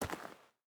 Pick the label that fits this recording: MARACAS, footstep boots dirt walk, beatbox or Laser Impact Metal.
footstep boots dirt walk